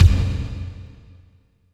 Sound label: KICK, Tekno Industrial; East West